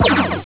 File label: sound fire